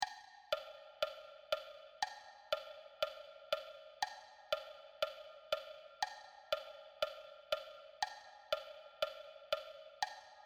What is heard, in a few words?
Marching Bass Drum